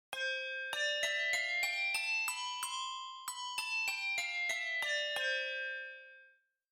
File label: handbells; sibelius sounds